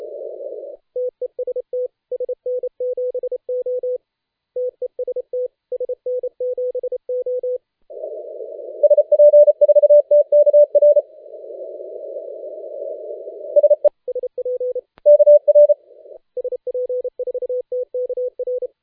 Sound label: CW 3,5MHz; contest; SP4TKR de SN7O; TTC-SPCWC